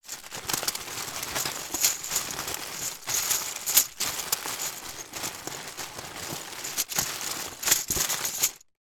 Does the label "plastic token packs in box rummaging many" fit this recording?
Yes